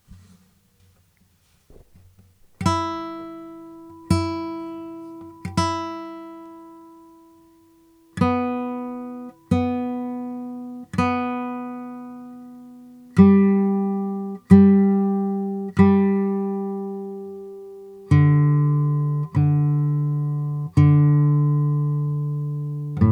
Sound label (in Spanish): afinacion guitarra